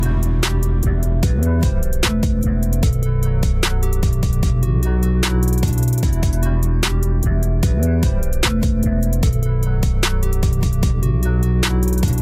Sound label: Apple Mix Ringtone